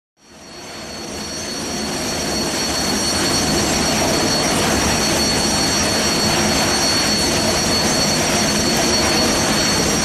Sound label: Hydroplane Idling A